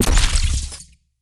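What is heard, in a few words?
mag shield, impact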